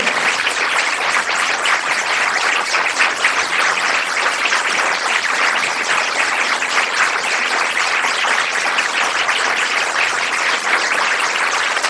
applause

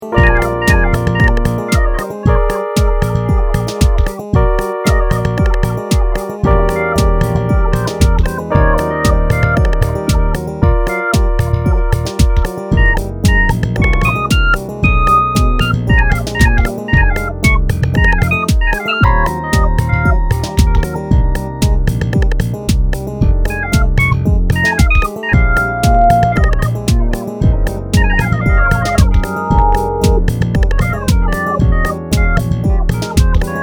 FunkBlues Chords; MachineImpro

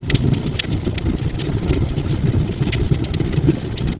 fire

